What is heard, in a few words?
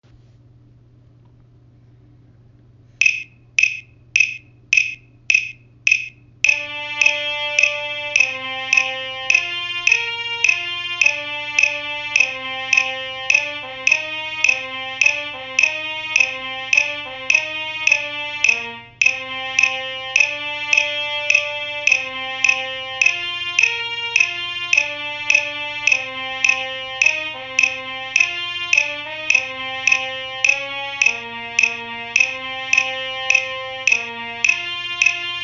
trumpet